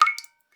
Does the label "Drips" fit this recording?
Yes